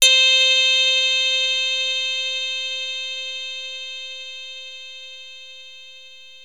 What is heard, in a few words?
Access Virus B; Synthworx